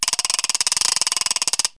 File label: clicking, cartoon; Wired for Sound Pro CD; Aristosoft, Inc.